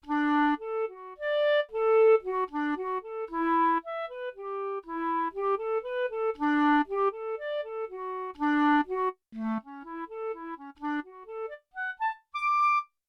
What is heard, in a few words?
Unison Player, Bb Clarinet and Clarinet, Yamaha TX16W